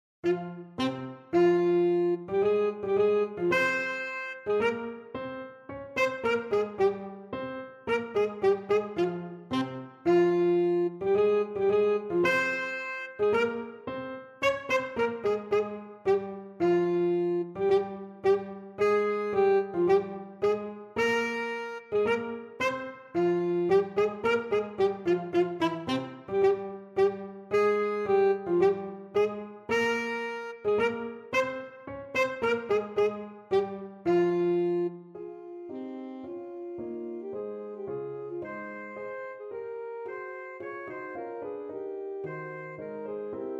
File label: saxophone